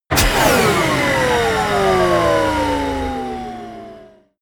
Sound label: wind down